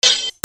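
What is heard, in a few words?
percussion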